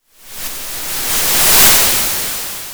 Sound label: Sound of waves crashing on a shore